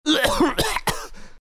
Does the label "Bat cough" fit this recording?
Yes